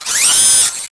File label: Machinery; Scratch